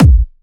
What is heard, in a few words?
Kick, 136BPM; Alone Kick